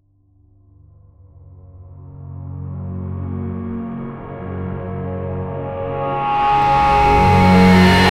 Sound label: rising tension ambient